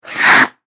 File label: Ice Skates Blade, Scrape, Stop; Sports; Ice Skating